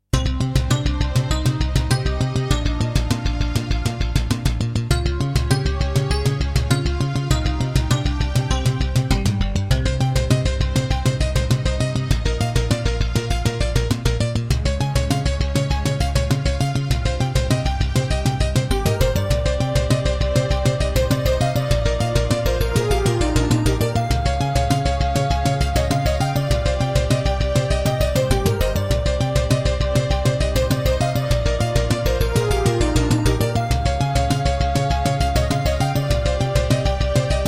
Terratec Wave XTable; Introduction; Hocus Pocus

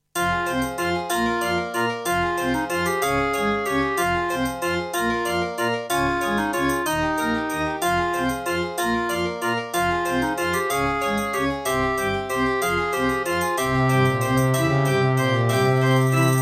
Track 6, Roland SD-50 GS MODE; Death Gate

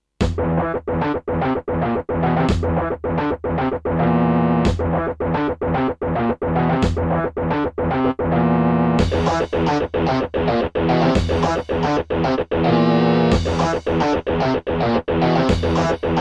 Gravis UltraSound 1MB Rev.3.4; Doom

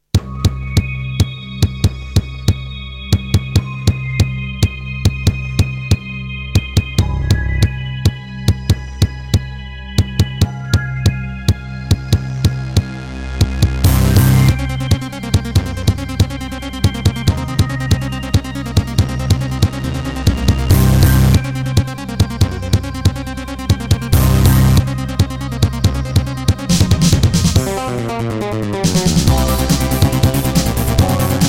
Tascam Pocketstudio 5; sigil doom episode 5

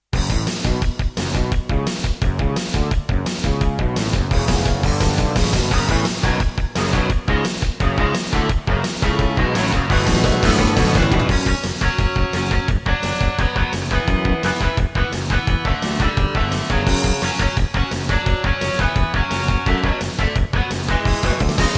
Dreamblaster X1; Serdaco; Duke Nukem 3D

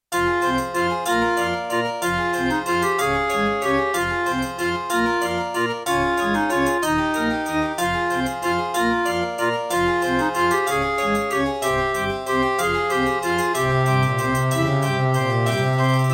Roland SC-55mkII; Death Gate - Track 6

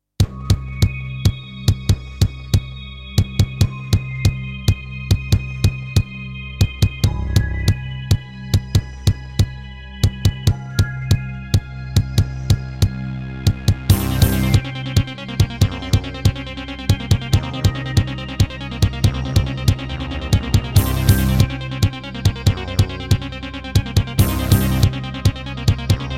Terratec MiniWaveSystem WT64; sigil doom episode 5

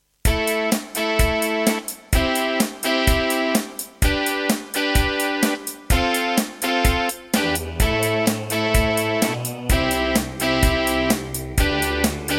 Yamaha MU2000EX XGMODE; raptor call of the shadows

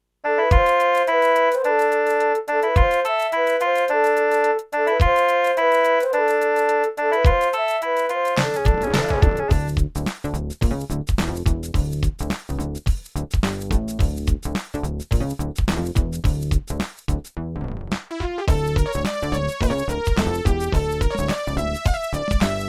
Egypt, Gravis UltraSound; 1MB Rev.3.4; Lost Vikings

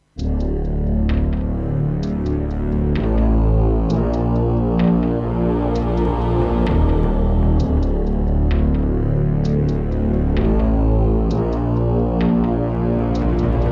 Hammond GM-1000; Descent II - Briefing

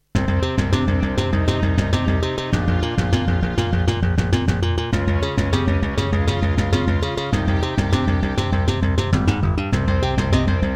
Tascam Pocketstudio 5; Introduction; Hocus Pocus

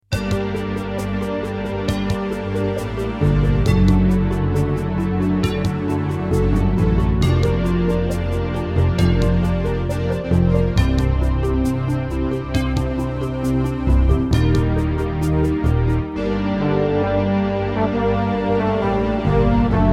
Terratec MiniWaveSystem WT64; Quest for Glory 4; QFG4

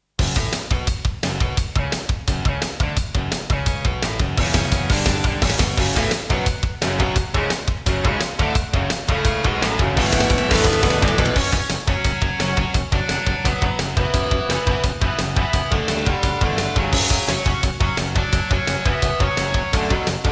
Intro, Alesis NanoSynth; Duke Nukem 3D

